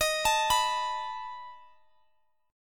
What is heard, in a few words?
B 7th D sharp